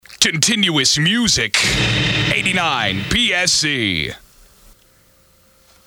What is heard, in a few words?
SWEEPER Continuous Music